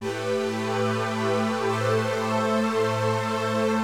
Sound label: SYNTHPAD HOUSE 125 A; eJay; Sphere